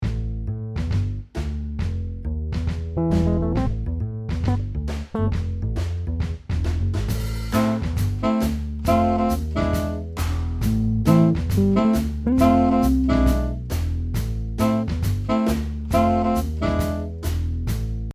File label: R&B Intro